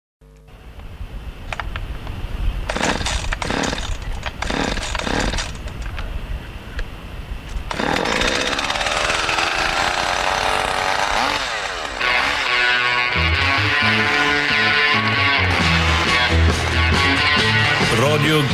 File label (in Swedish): startjingel